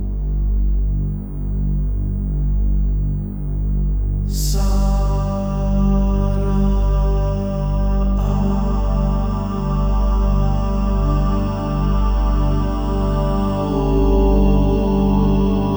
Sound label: Beach Music Loop; Aths